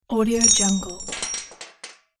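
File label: Chains, chain & prison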